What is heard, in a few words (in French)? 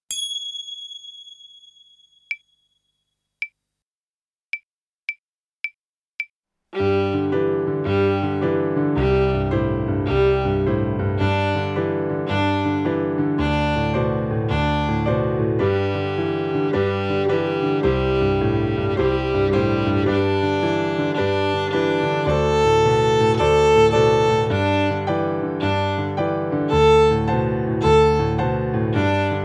Détente Alto